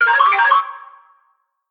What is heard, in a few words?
tester warn